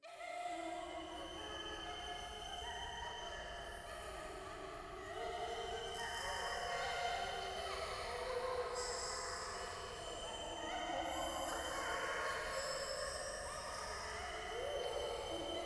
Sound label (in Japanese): 喘ぎ 山奥